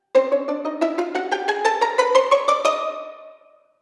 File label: Cartoon running sound; silent letter games